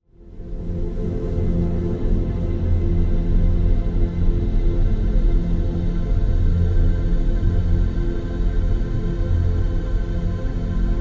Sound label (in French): Musique d ondes theta